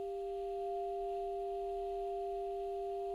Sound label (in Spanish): Multiarmonicos